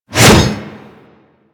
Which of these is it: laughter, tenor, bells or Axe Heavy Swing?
Axe Heavy Swing